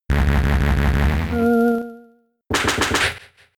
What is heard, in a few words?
Payback; attack moves; Pokemon; Gen 4; DPPL HG SS